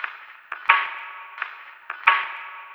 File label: LoFi Funk 087, Lounge Drumz